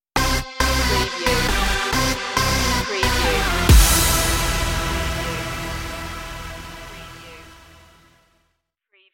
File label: tv punch stinger